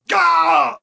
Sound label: Wound Crit; Valanar